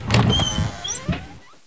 wood door; nzp

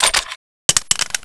lmg clip out, weapons

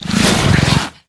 bite kill

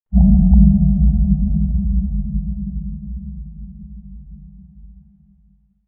low hit, ambience

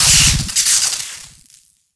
blink fire, weapons

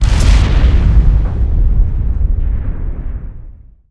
explode

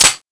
clipin, weapons; nzp

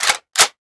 clip in, weapons

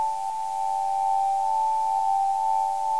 powerup; nzp; revised announcer sounds

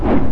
fan; q3rally

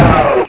nailgun; ParoxysmII; weapons

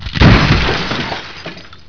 ice break; GZDoom

